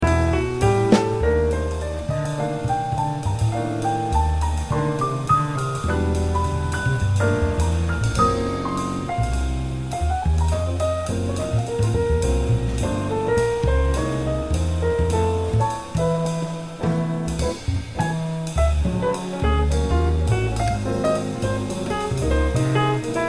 drums

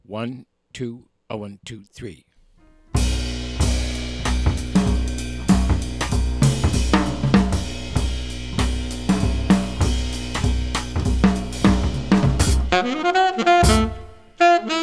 minus piano; count off sets up the intro; play the intro; play figures and comp for the melody

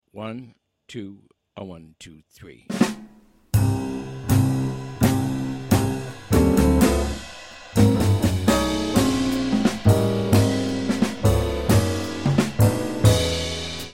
SLOW minus melody

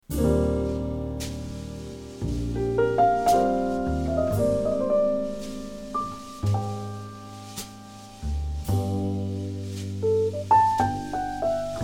minus melody

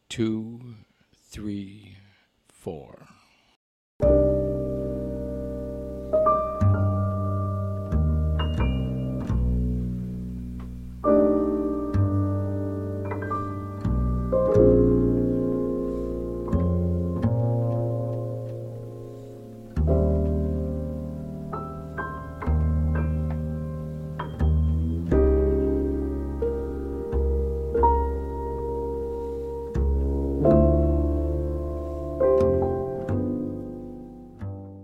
minus melody inst

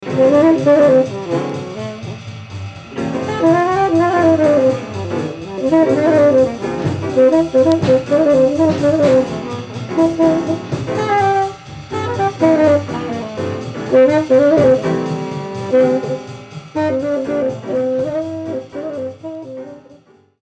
solo

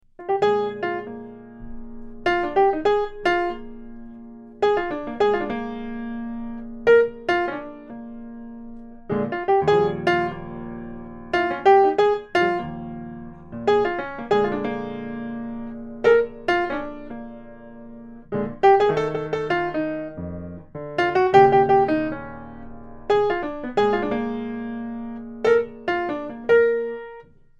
Blues

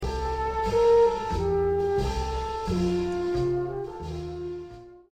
French Horn

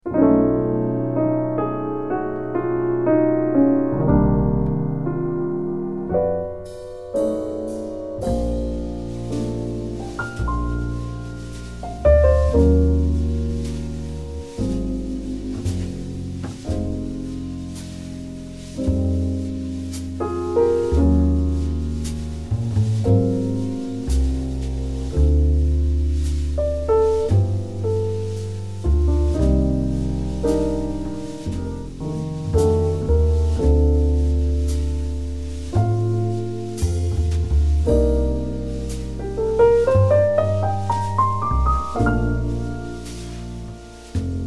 minus melody

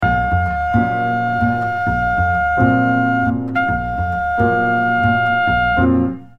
drums; the four-measure syncopated pattern starting in measure 9 (the break is in the melody choruses only)

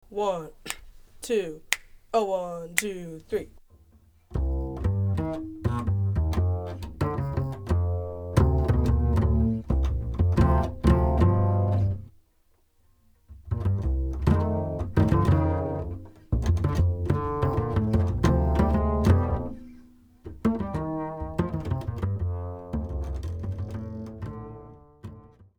minus melody; count off sets up the melody; play the melody; solo 2 choruses; play the out melody and coda

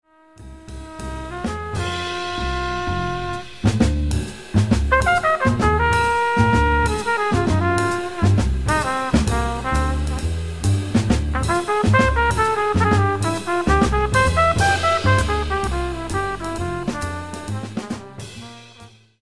minus piano solo

